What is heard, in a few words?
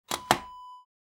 camcorder tape set down